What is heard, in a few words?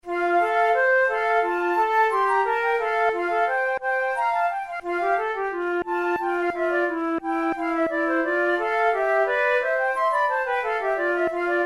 duos moderato, flute; devienne; nouvelle methode pour la flute 18